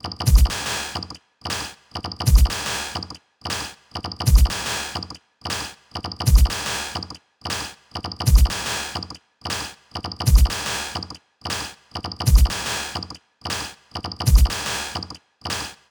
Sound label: Modular; sampling instrument; Rando by Monkeyc; Atlas